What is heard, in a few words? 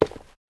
Footsteps, Human, Tile, Run; Backrooms 2D